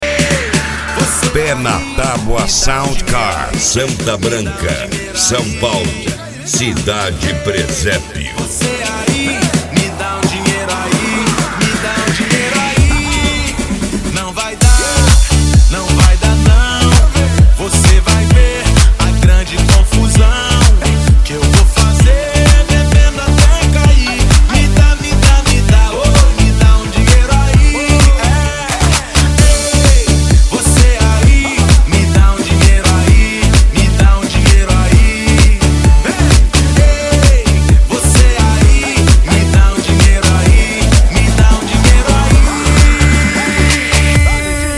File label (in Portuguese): sound car carnaval